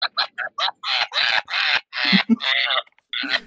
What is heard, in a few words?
laugh